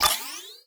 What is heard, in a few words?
UI Scifi Tech Start; Tom Winandy SFX